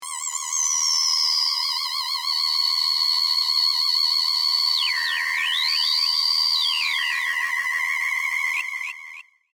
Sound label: Transmission Burst, Scifi, Transmission, Burst, Science, Fiction; Communication; BlastWave